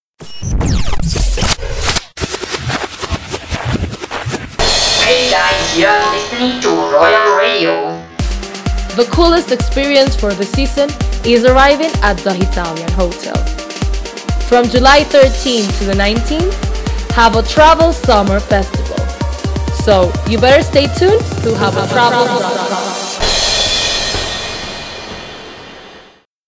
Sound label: Summer Sound Jingle